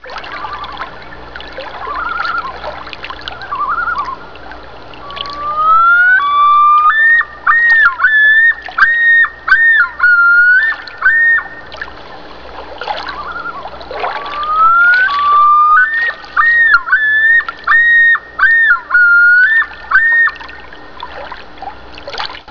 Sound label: Loon